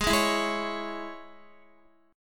G sharp Suspended 4th Sharp 5th Guitar chord, down; strummed; 4F,4A,5C,5G,5C,6E